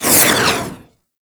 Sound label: Rifle Alt Projectile By, Weapons; Warframe; Tenno; Gyre